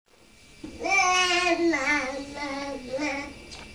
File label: babbling